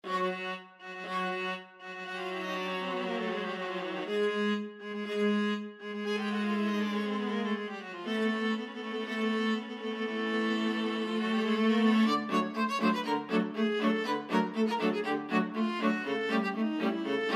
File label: viola ensemble; fucik entry of the gladiators